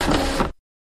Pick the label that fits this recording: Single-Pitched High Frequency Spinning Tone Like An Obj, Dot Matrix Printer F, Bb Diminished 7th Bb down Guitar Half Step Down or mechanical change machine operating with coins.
Dot Matrix Printer F